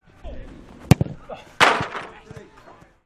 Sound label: Soccer score goal training